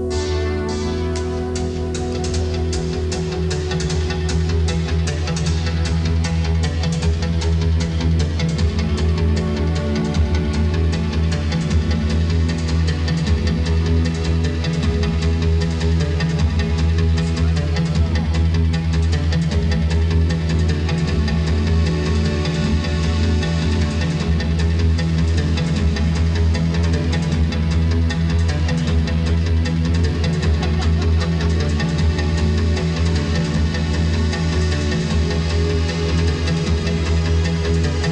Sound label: Diamond Diary, guitar part